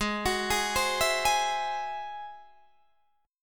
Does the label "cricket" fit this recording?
No